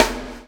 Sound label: CHAIN; Spectrasonics - Backbeat